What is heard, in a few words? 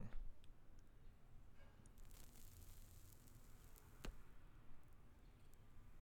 Cigerette Drag